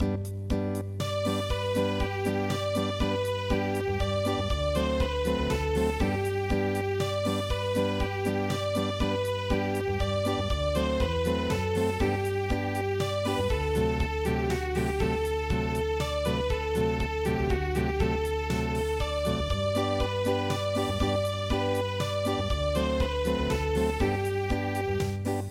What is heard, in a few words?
Unplugged; hurry shepherd